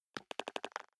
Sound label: group call connect; Telegram; tdesktop